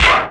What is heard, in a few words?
PERC FX, SYNTH PER; AKAI S6000 CD-ROM - Volume 1; PERCUSSION